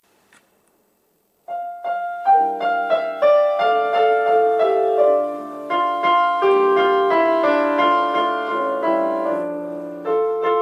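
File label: song, backing track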